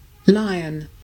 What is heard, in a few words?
lion; animal